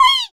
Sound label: Zaghrouta End, Roland BK-5 Percussion